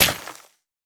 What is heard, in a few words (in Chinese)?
可疑的沙砾破坏声